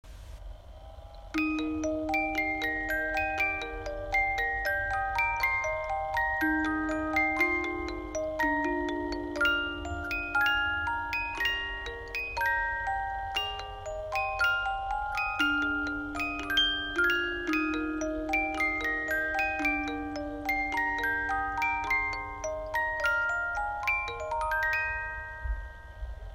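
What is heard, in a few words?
36 lames, boite a musique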